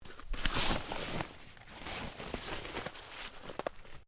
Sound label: Body Movement Snow Move; Body movement foley to simulate falls and deaths; Human; Bodies